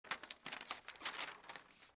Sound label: Paper Crinkles and Textures; The Producers Library